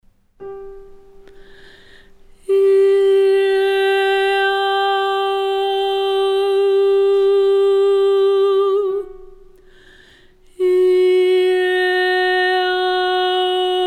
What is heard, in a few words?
midrange exercise